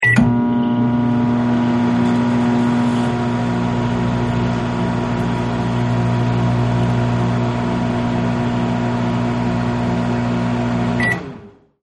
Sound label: Microwave Door Close, Microwave Key Beep, Microwave Activated And Stop; Appliances; Household; Michael Huang